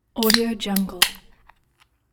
can of beer opening

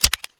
pickup alliance rifle

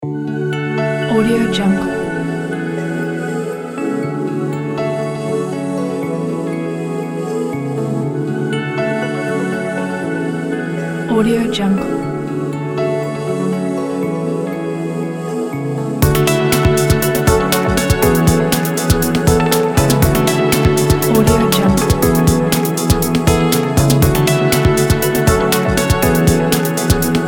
relax dance

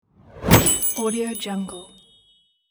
magic spell

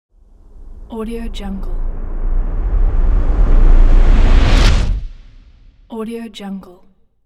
rise shift

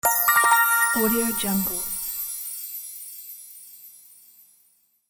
sparkling magic prize

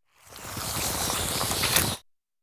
tentacles retract

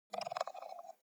office computer mouse wheel scroll slow; foley; smartsoundfx

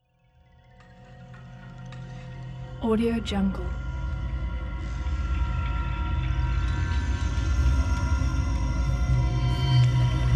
eerie suspense ambience

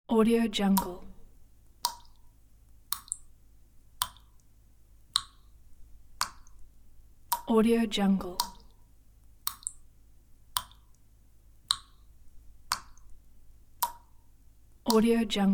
water drop

